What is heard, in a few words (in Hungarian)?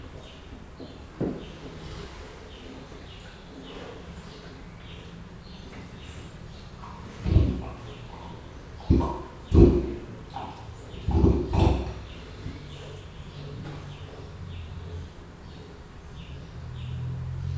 rejtett mikrofon horkantgat külső zajok, leopárd; jaszberenyi zoo 2015